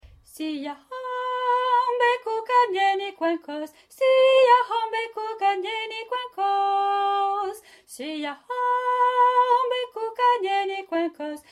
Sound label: siyahamba soprano